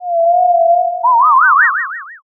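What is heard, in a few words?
uguisu, Japanese bush warbler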